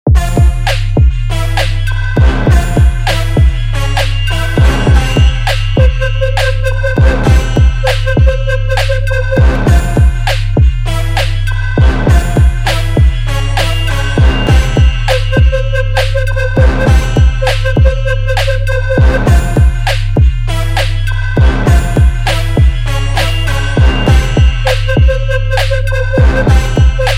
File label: dark bass